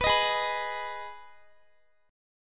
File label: Ab Minor, down, Guitar; strummed; 4E,4A,5D,5G,5C,6F